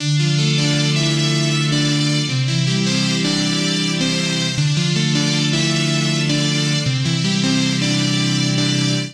Keys C Major 105 bpm; Accompaniment; Tonal; MIDI Clips; Impaled Keys; Drive and Glow; Ableton; Factory Packs

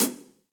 Hihat Closed Low Loud Squashed; Drum Hits; Ableton; Factory Packs; Session Drums Club; MPE Hits

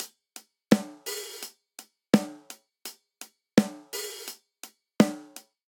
Straight Eighths Rock Snare & Hihat 84 bpm; MIDI Clips; Ableton; Factory Packs; Drum Booth

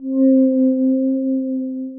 Glassmosphere, Ethereal Pads; Multisampled; Ableton; Mood Reel

